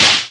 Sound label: Claps; Vengeance Samples